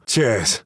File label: cheers